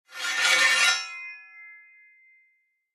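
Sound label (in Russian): медленное устрашающее изъятие из ножен меча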